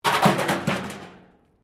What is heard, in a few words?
metal rubbish bin smash concrete; Metal (Sound Effects:Materials